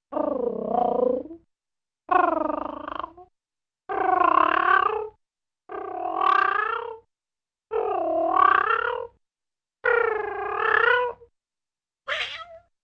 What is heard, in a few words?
purring; figaro